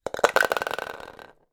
toy plastic skittles knock over

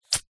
animals snake spit

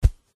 footstep single walking on thick fabric stomping; zapsplat

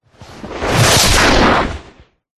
sound design hit whoosh into a punch like impact; zapsplat

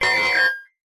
science fiction computer; Audio Mangler

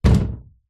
impact wood thump hit hollow

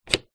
musical midi keyboard controller fader movement; zapsplat

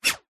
bullet whizz by

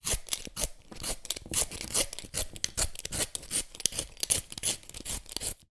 peeling potato with manual peeler

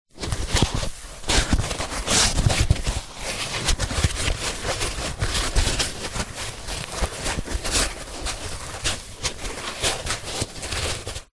office packing foam panel bend handle movement

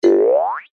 cartoon spring boing jaw harp ascend

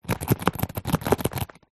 household purse childs empty shake; zapsplat

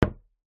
sport snooker pool junior ball set down on table

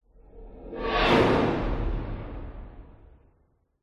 horror whoosh growling evil scary

